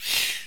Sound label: Explosion; Ginga Sengoku Gunyuuden Rai